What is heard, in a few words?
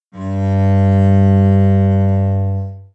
cello g, additiva; adsyn